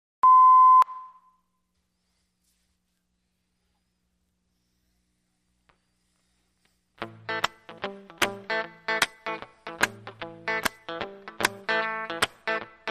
Texas Blues

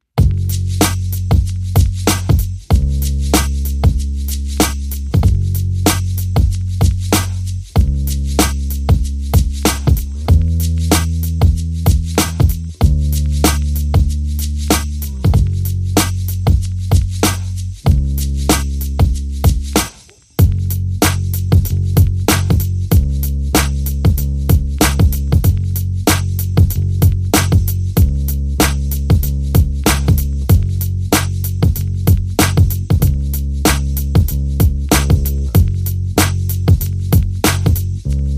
Drums and Bass Mix

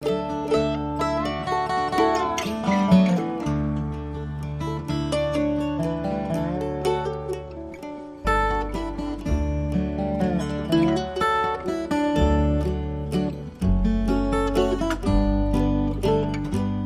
Steel String Waltz

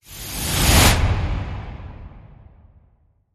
Inhale Exhale Breathing In

